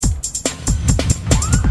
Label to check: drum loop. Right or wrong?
Right